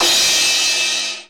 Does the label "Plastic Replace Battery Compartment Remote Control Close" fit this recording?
No